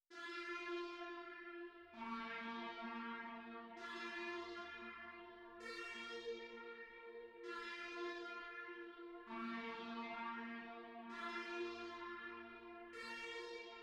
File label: 131 BPM D# Min Trumpet; Melody; Loop Stems; Cymatics; 2022 Melody Collection Platinum Expansion; Murderer